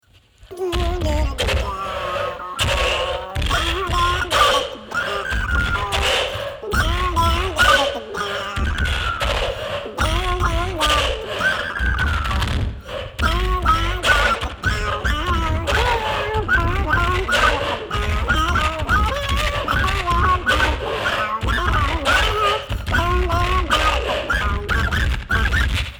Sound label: pant